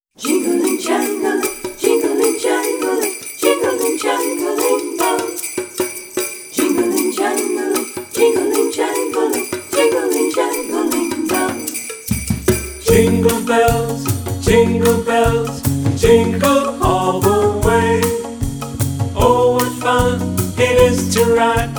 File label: jingle bells